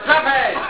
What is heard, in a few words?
slaphead; SLAP HEAD Game